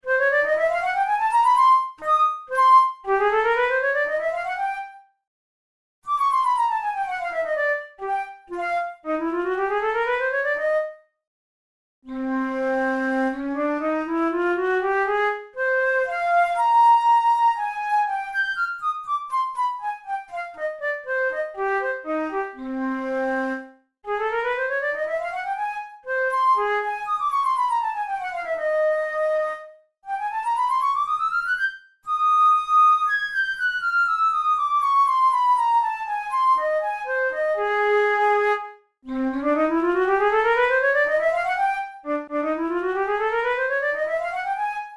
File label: studies for the boehm flute; drouet 72